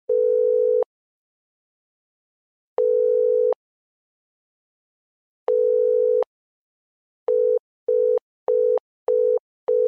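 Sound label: telephone beeps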